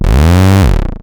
Acid Sounds F#, Synths; Vengeance Samples

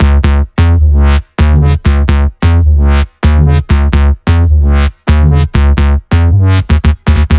130BPM So Much Love Melody; Vengeance Samples

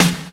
Snares & Claps; Vengeance Samples

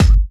Sub Perc, Bassdrums; Vengeance Samples

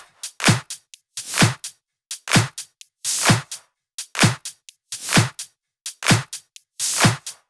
128BPM Brazil Kit; Vengeance Samples

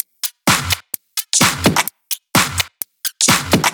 Drumloops 128BPM

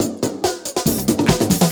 Loops Fills; Vengeance Samples

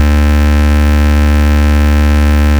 Bass D, Root D

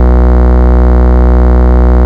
Root B, Ultimate Bass; Vengeance Samples

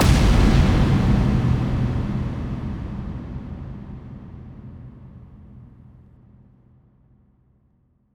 bassdrum reverb FX kick; Vengeance Samples

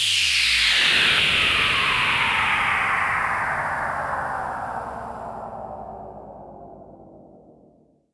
Downlifter

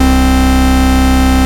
Basses C; Vengeance Samples

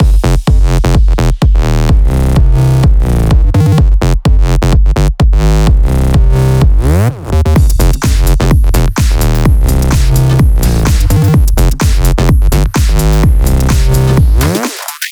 127BPM Mix; Vengeance Samples; Opto Kit

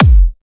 128BPM Kick; Vengeance Samples; Vapour Kit

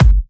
Hard Kick; Vengeance Samples

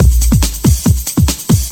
Breakbeat; Vengeance Samples; VEC2 Loops

